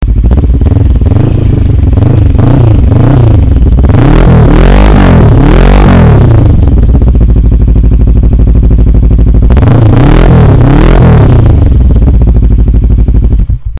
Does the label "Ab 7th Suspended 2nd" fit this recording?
No